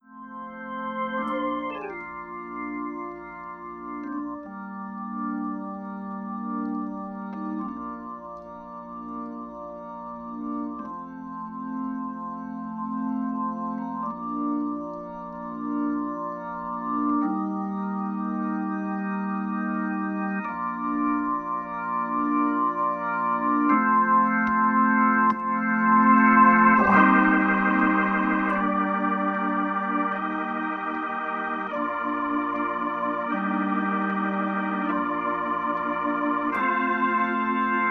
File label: Organ Dry; illusion